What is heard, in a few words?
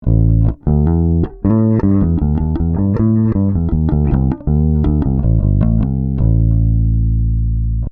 SSL SiX CH 500 Bass DI and Comp; ssl six ch channel strip 500 series preamp